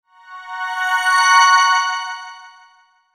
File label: Shimmer Flute Pad Swell High; Shimmer Flute Pad Swell Delay High; Musical Sound Design; Blastwave